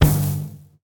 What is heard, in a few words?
Hit Chest; smite; kumbhakarna